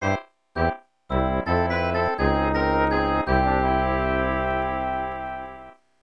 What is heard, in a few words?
Game Over Music; SMAS; Super Mario Bros.,Lost Level